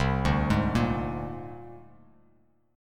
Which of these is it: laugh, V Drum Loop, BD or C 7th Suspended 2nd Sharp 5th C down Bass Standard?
C 7th Suspended 2nd Sharp 5th C down Bass Standard